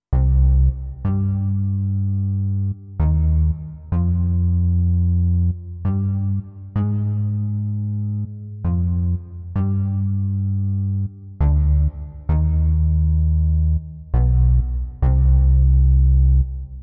Synth Bass

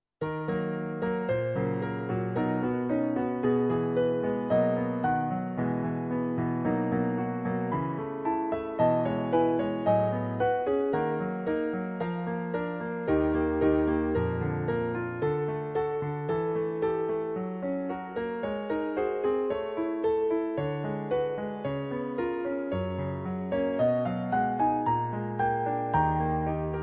Piano folk

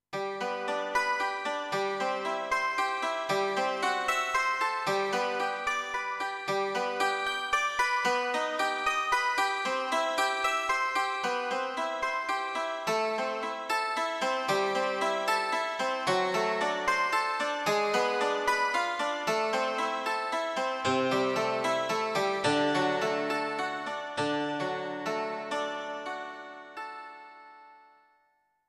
Dulcimer

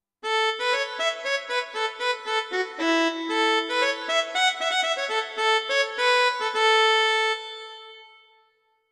Fiddle

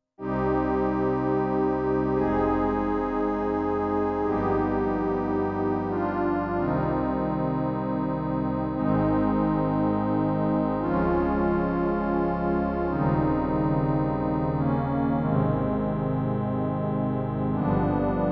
Tine Pad

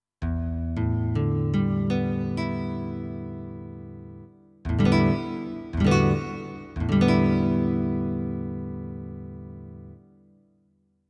Vel Gtr Harmo

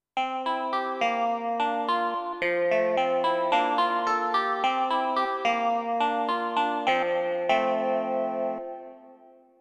Pierce Clavi

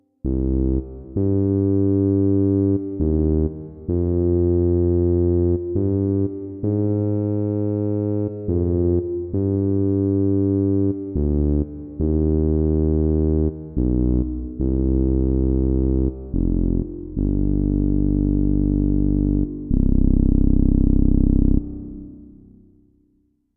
Syn Bass Dark

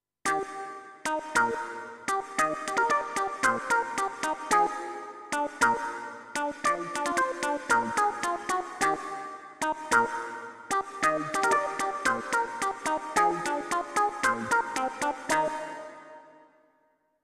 Clavi Wah

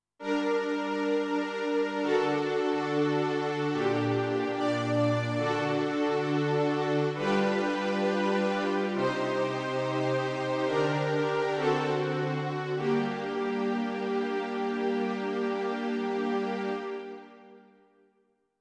Syn Strings

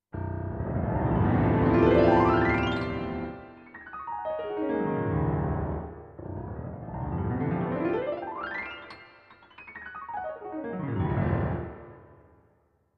Glissandi

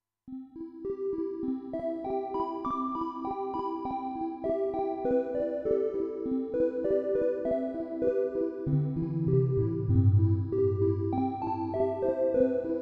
Tiny Bells

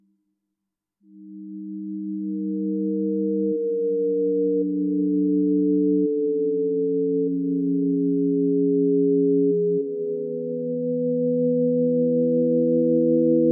Gtr Feedback

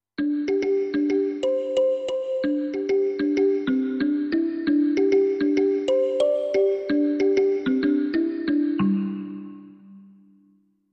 Kalimba

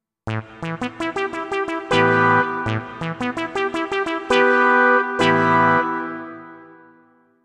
Waspy Sinth

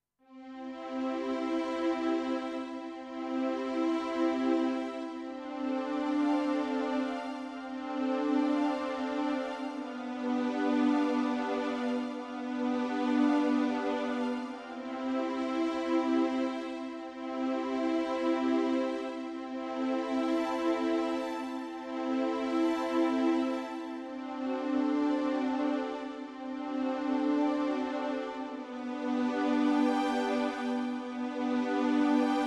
Slow Strings

